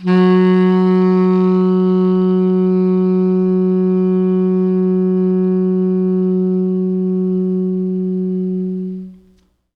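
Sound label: T Sax, tenor sax; B. Webster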